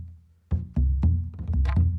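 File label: Calm Far, music; from dust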